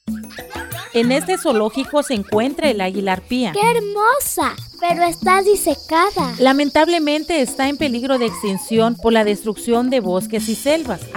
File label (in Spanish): Aves Rapaces Aguila arpia